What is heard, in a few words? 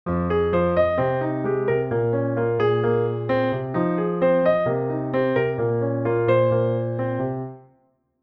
counter with chords